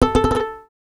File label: Violin Extended Technique